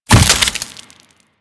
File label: Cluster Explosion; Uzi; Warframe; Infested